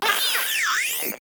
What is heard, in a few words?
Maggot Bark; Enemies; Vocals; Warframe